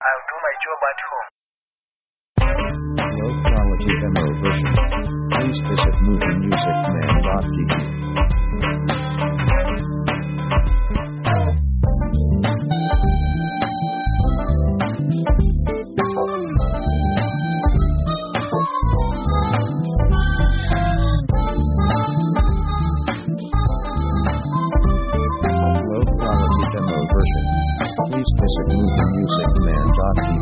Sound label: pop jazz